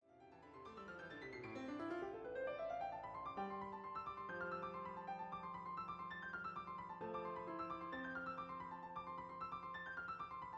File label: 4 Hand Piano